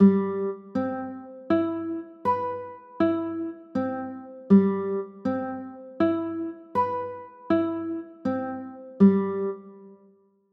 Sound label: c maj7